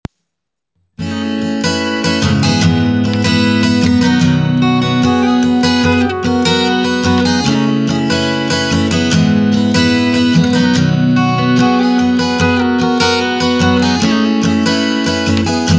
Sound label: Acoustic guitar capo 4